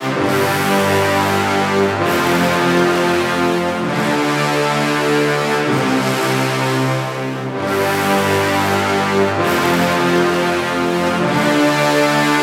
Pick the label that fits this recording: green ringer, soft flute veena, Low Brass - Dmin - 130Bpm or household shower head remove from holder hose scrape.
Low Brass - Dmin - 130Bpm